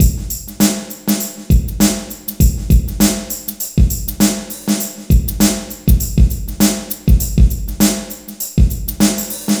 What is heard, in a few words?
FOUR BARS 100, LOOPS